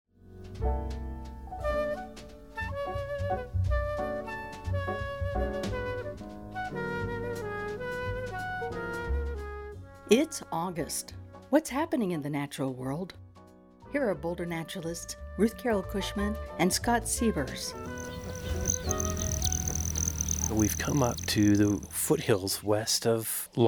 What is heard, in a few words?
Nature Hummingbirds; Nature Almanacs from KGNU Radio, 88.5 FM, 1390 AM; 2020 Hummingbirds